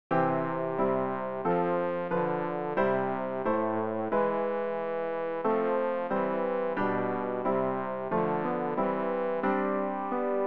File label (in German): bass; Ref. Gesangbuch; RG 482: Jesus lebt, mit ihm auch ich